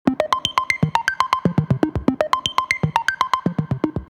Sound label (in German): Klingelton, modular synthesizer; Superbooth22